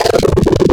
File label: Level Up, Growl Tables; Cymatics; Growl Tables Vol 3; Serum